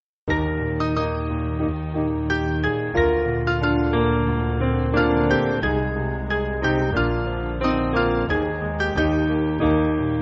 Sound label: Piano